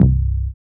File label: Dance Bass; Sound & Vision - Gigapack II CD 1